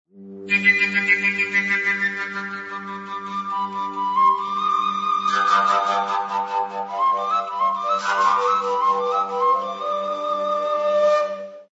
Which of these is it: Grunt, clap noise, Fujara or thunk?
Fujara